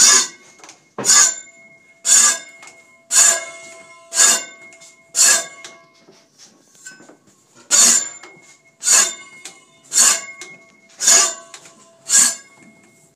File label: parchment singing